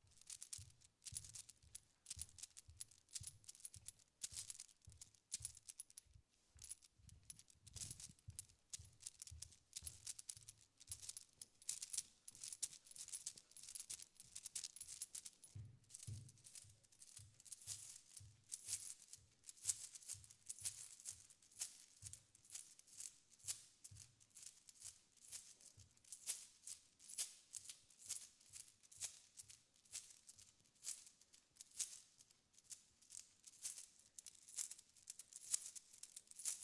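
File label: shagi s monetami v karmane, footsteps with coins in pocket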